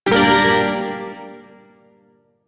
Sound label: Shawzin Min Chord H; Warframe; Duviri Shawzin